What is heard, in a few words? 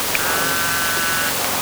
pond